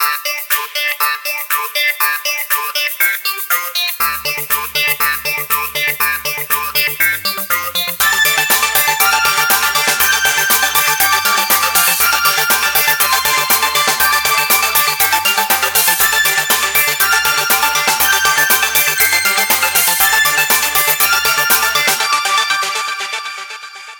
music disco; Samsung GT-i7680; cmcc

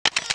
cam snap

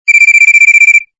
Tone; LX160

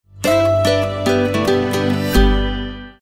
Power On; Emobile Ireland

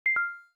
cam stop; LG; X power K220

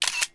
camera click slow; Asus Padfone-2

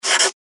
dice, Game Sound; LG GD900 Crystal

